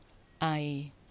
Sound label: breath cough